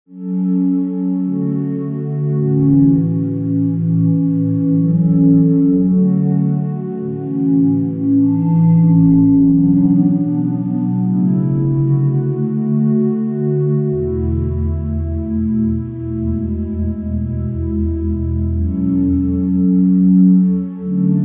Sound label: Underscore PAD; Worship Flow